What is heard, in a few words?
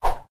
Caveman Shot; Doodle Jump 2; Android